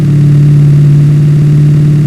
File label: Acc, v8 c63